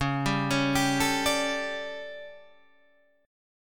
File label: D Major 7th Suspended 4th, chord